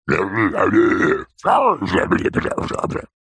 cartoon monster gremlin troll crazy grunting vocalisation